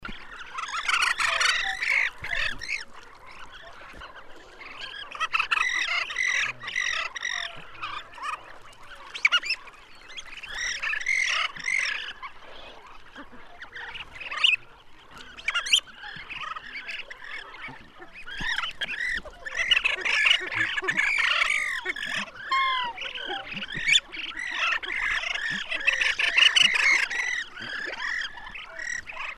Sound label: Pink eared Duck